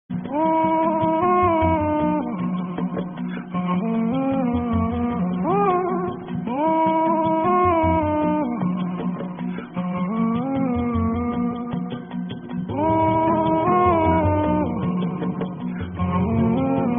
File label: choral chorus but deeper